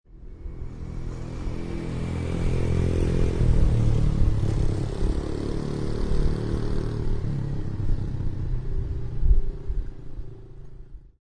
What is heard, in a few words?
beach buggy